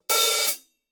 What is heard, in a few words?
formula 602 classic sounds 15 sound edge hi-hat open; paiste